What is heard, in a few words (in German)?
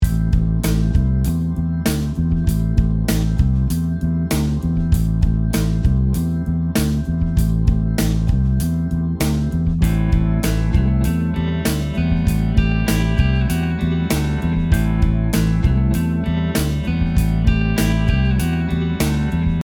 Rock Bass Pentatonik; rockige Bass Riffs